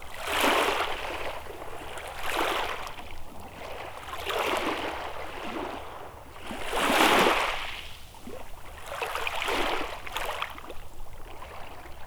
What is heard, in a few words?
shore waves